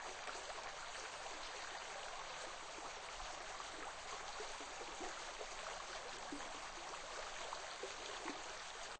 stream

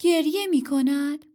crying

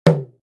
instrument drum

